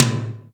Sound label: TOM, Drum Dry; East Collexion; Drum 1